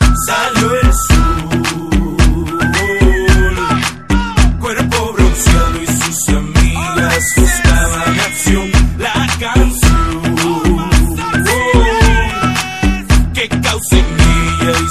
Regeaton; ringtones; funny

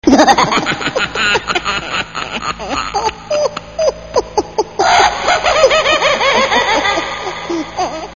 Business Man Laugh; Ringtone; ringtones; funny

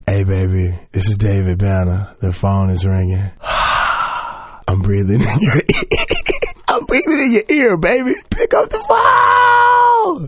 Breathing In Your Ear; ringtones; funny

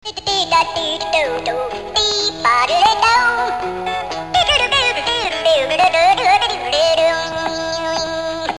Taratitatutututu Ringtone; ringtones; funny

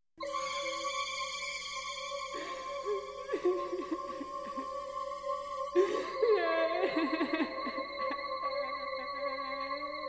Woman Ghost; Scary Sounds; ringtones

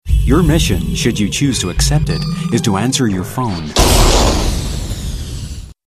Funny, ringtones

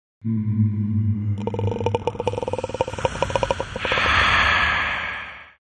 Scary Sounds - Scary Call; ringtones

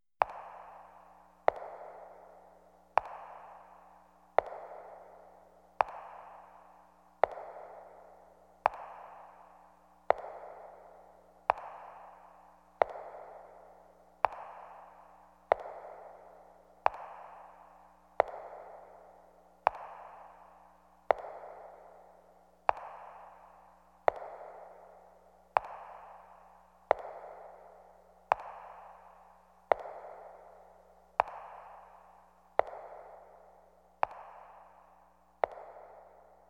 Footsteps

